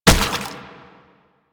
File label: Proto Infested Brachiolyst Step Water B; Enemies; Steps; Warframe